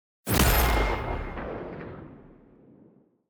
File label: perks lockin primary